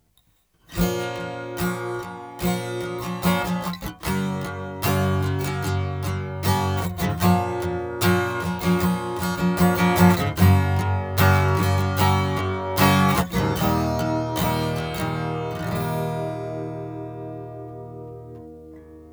chorus; tc electronic; gnatural; Le chorus (et associé avec un detune) également est vraiment beau

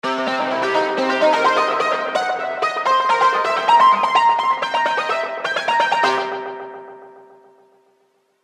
piano guitar; best service galaxy piano 2

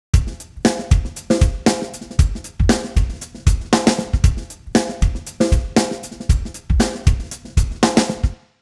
drums, timestretch, 90; i3 dsp quattro x

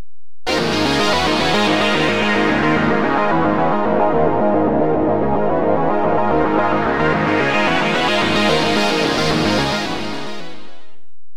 arp

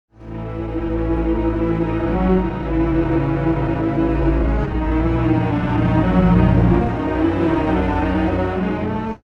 5, strings, pitchshift

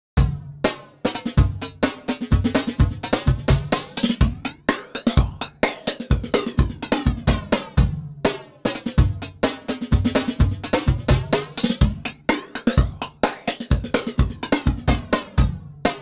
drums flange; u-he uhbik

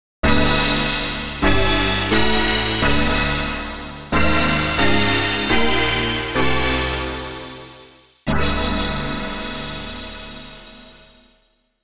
digital; fabfilter twin2

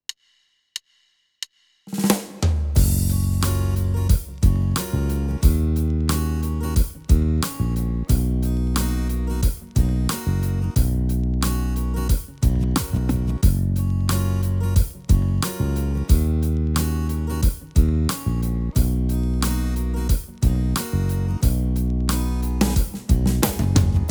style pop and rock acoustic rock, Yamaha tyros3

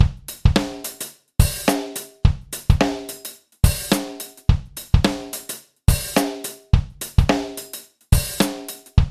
acoustic drum; eastwest colossus

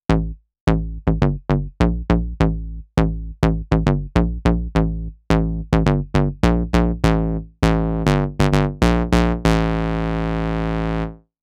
bass fat; davesmith mopho

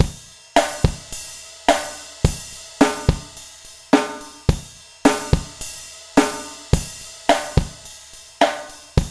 vintage kit; eastwest colossus

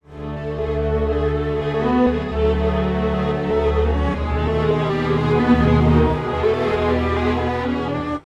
strings, timestretch; celemony melodyne

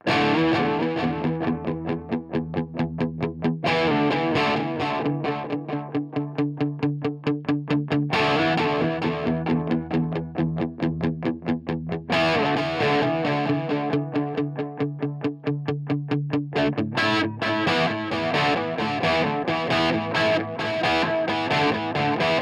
pan, delay; tc electronic nova

